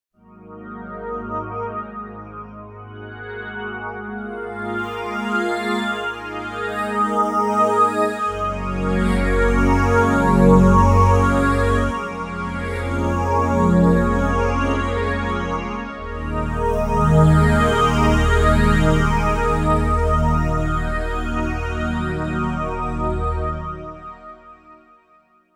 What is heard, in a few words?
Pad, v-synth; Boone